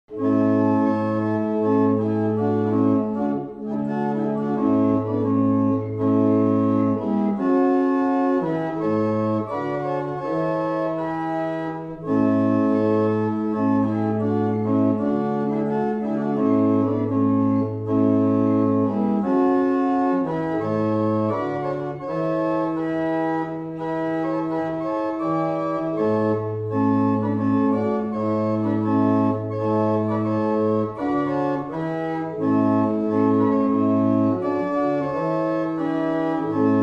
barrel organ; Mattishall

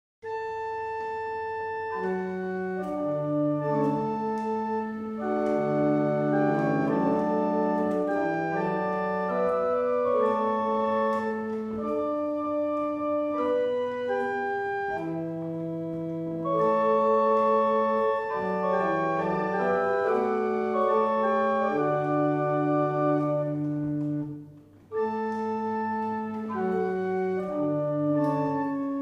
Double chant, barrel organ; Winwick

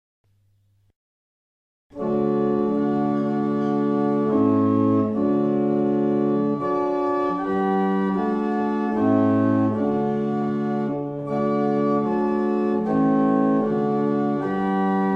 barrel organ; Mattishall

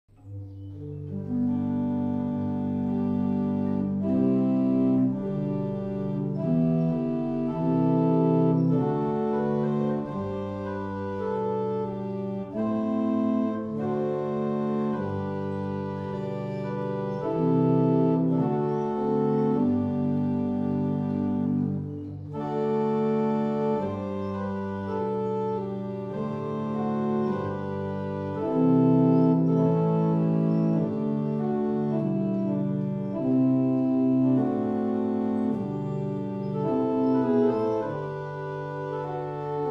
Evening Hymn, barrel organs; Fobbing; The organ is operated from the rear. The softwood Gothic case has three flats of gold pain

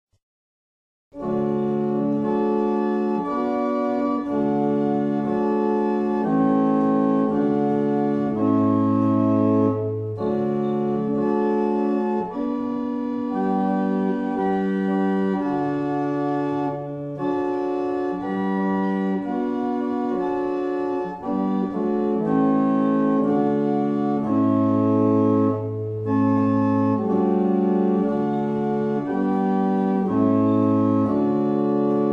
St David, barrel organs; Mattishall

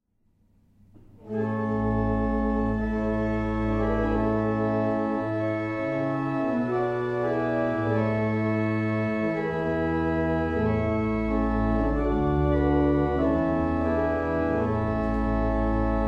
Abingdon, barrel organs; Meldreth

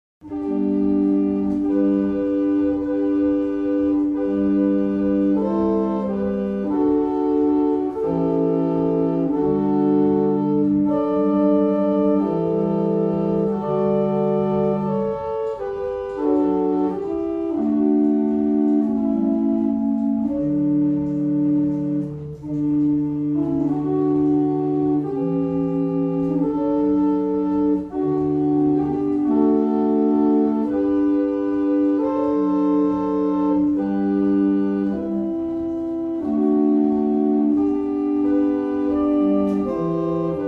Morning Hymn, barrel organs; Cardeston

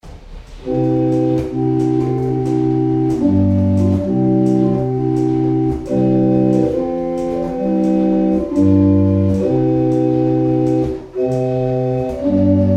barrel organs; Boxford; New Sabbath

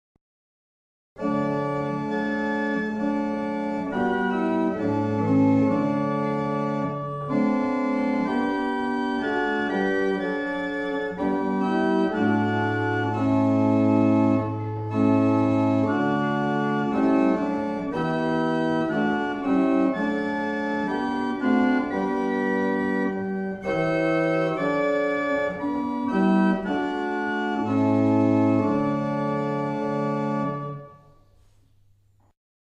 barrel organ; Mattishall

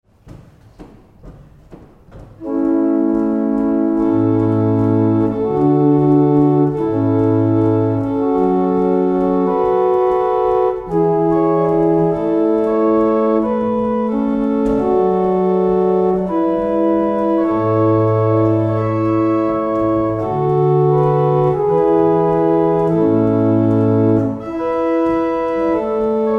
Evening Hymn, barrel organ; Letheringsett

